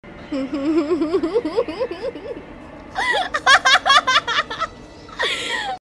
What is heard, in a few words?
psychopathic laugh